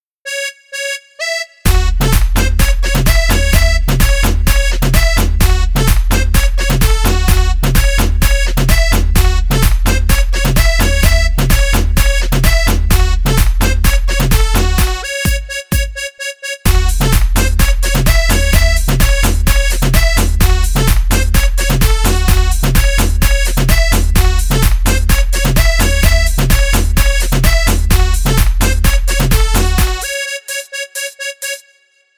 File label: Construction Kit Fs Full Loop